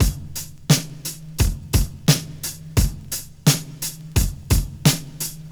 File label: 87 bpm drum loop G# key, Free drum loop - kick tuned to the G# note. Loudest frequency: 2871Hz